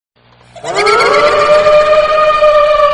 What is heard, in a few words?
bhoot laughing sound